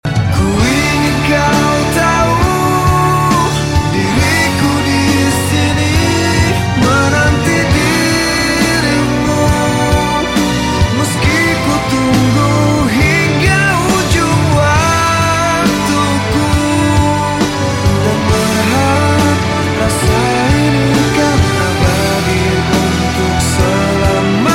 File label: Ringtone